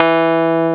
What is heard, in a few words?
Keyboards Old School, E2; USB Soundscan vol.09; AKAI